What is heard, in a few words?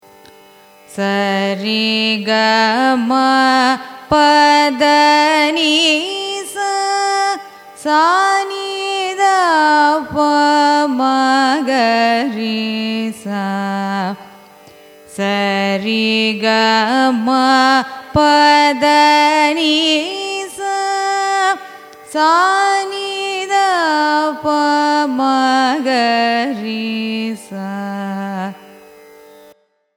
raga keeravani arohanam avarohanam, carnatic music